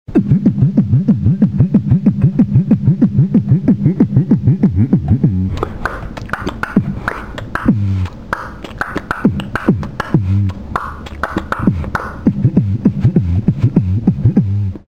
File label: Vocal Bass Scratch